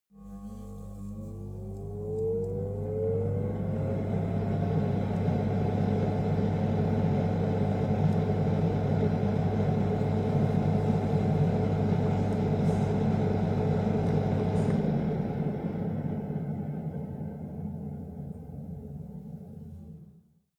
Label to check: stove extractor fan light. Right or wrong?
Right